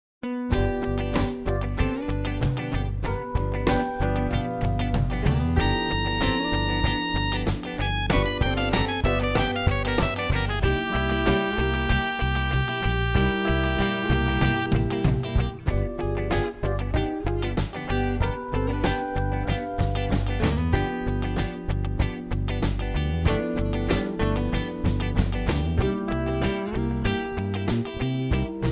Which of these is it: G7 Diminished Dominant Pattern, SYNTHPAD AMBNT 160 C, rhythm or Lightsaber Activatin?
G7 Diminished Dominant Pattern